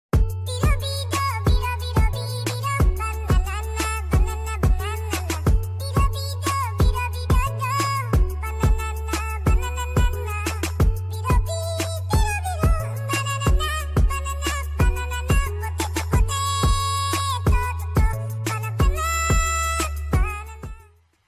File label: cartoon animation ringtone